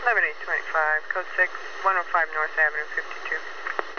POLICE

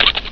snap

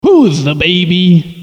Excitement, Vocals, bowl

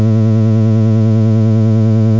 ship; avenger

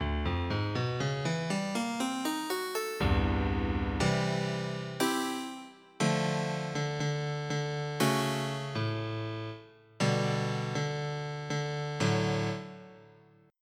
Battle Percussion; FF7

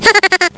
laugh; RunFight; Simulator

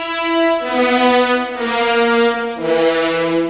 Horror Start Windows

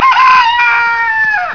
ROOSTER

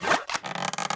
bow draw

